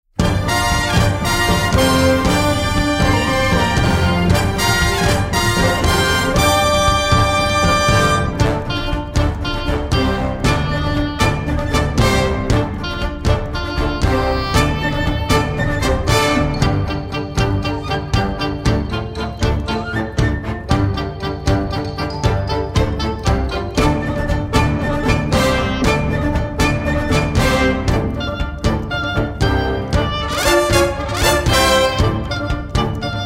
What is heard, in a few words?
exit music